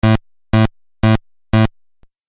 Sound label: Beeps Video Game